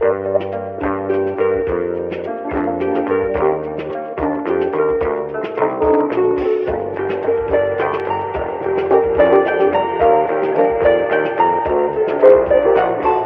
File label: horns, Loops Phonky